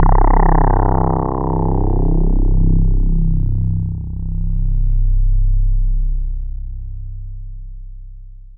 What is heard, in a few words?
one long filtery note, Basses